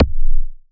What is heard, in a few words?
perc